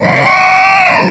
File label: Attack; KF Christmas; Elf